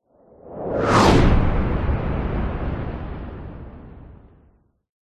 cinematic whoosh massive heavy ultra sonic; sound design

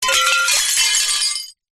glass pieces multiple drop ground smash

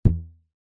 elastic ribbon twang; foley

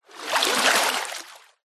nature water movement surface; zapsplat

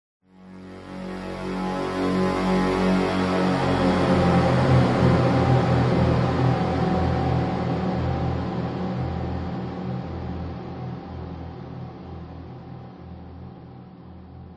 horror swell dark synth evil buzzing descending

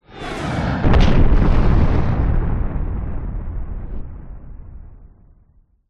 airplane jet crash explode designed; vehicles

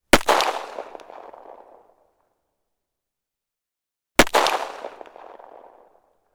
gun 1911; weapon; still north media

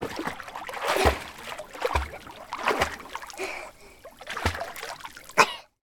sport swimming girl 5 years old; sound effects one

